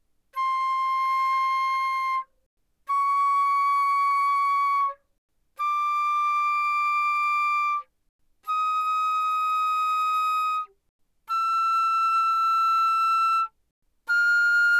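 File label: Flute non vibrato mezzo forte C6 B6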